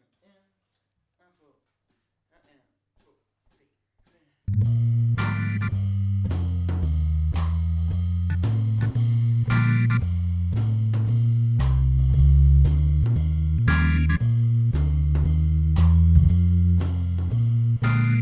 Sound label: Cymbal swing Conga